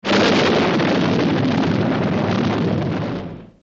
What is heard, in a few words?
Sonic Boom